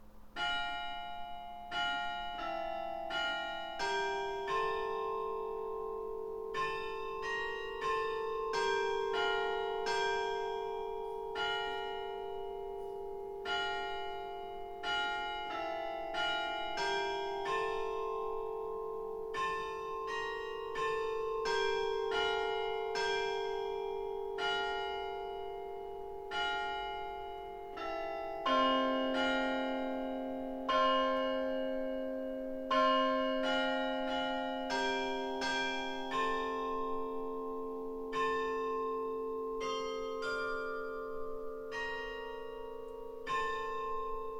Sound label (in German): Glockenspiel 18 Uhr